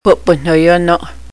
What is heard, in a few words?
drumstick